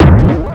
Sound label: Koopalings Land; New Super Mario Bros. Wii